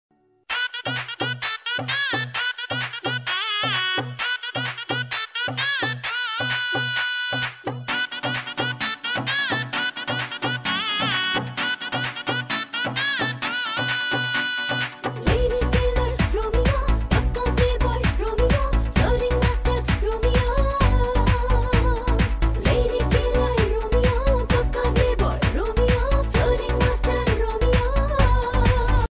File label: bengali ringtone; romeo